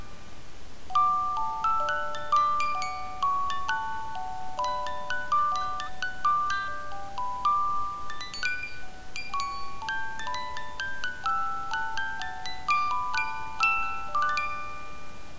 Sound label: - Music Box